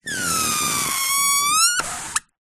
balloon air release deflate from valve hiss squeak; foley